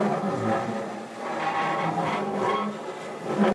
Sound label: Dragging Metal Looping